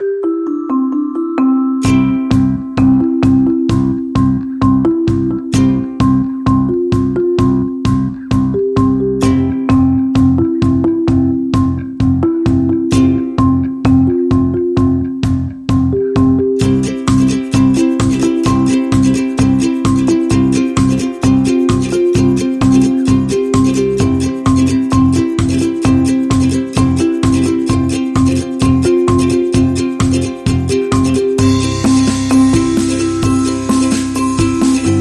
Upbeat

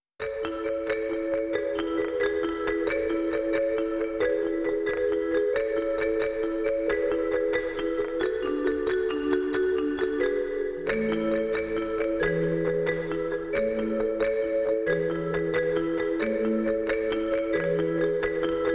Mallets and Piano Only

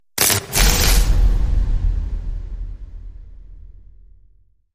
Transformer

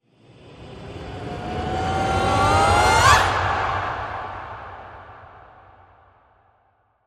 Vocal Fury War Rise

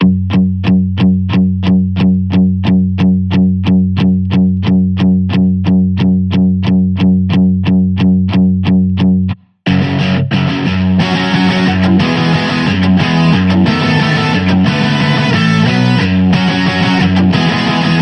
Guitar

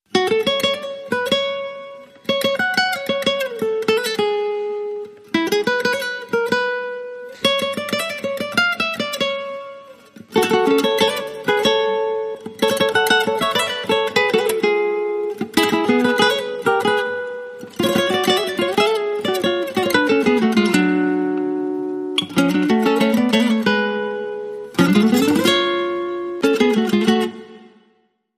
Guitar Only

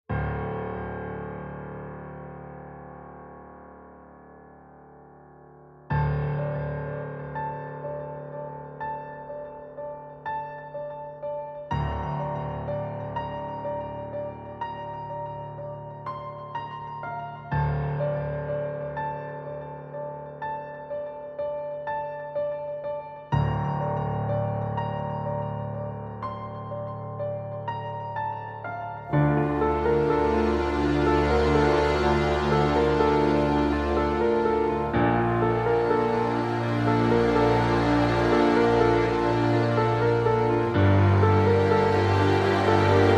Piano and Legato Parts

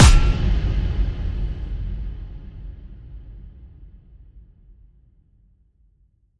One Shot Hit Dropout

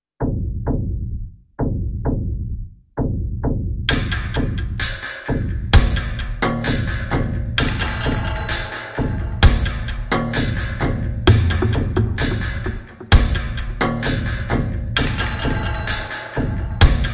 Hits Only

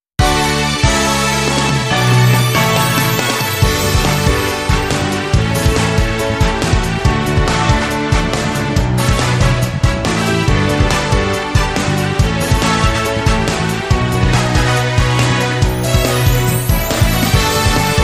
Blowing Wind

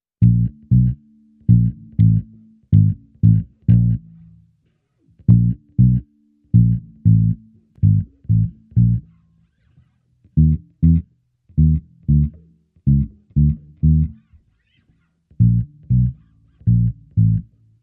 Sneaky Funk, Bass Only

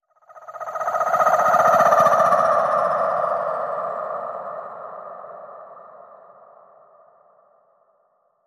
Vocals Futuristic Bend

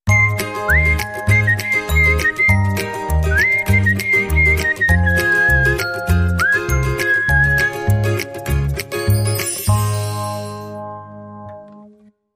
Whistling

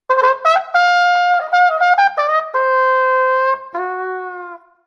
Blues Calling Jingle

